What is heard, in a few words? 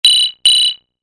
simple ringer